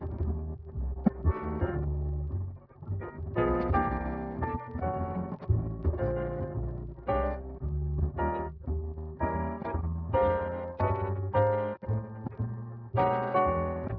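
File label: accompaniment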